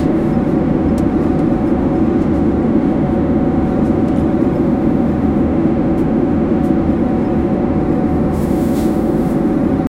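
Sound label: interior of a plane mid flight, constant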